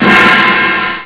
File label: HIHAT; Amiga Soundtracker Sample Packs